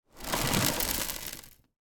stick insect plastic tank movement foliage eggs move; animals